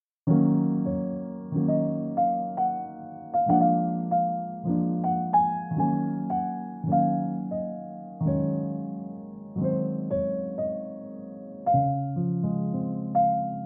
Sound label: Jazz